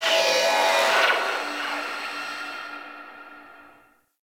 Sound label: Construction Site; Warframe; Venus Open World; Venus Landscape; Ambience